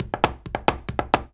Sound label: 133 STEPS, Spanish And Gypsy; Soundscan vol.59; Spanish And Gypsy Traditions; AKAI